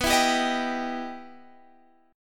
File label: E Minor Major 7th